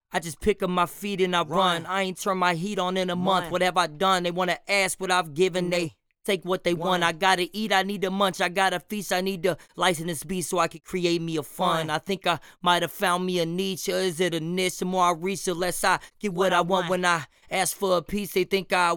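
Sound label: hip hop 75 Fm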